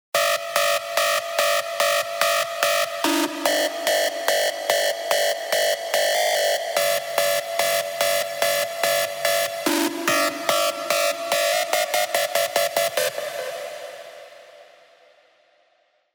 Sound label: Screech; Screech Schattenwolf; Revealed Serum 2 Big Room Techno Drops Vol. 4